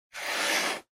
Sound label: Ballpoint Pen Straight